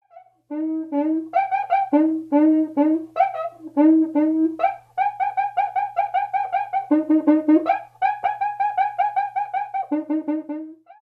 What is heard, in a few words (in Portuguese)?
cuica levada de cuica; erminho rabelo